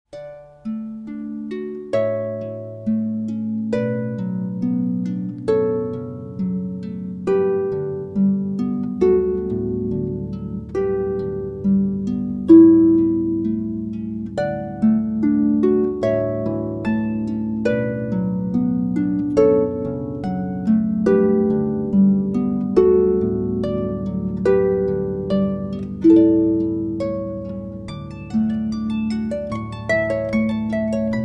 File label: Canon D solo, harps